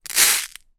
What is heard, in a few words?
leisure toy pin art plastic tilt pins hit glass front; pinpressions